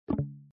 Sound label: double end; moonshot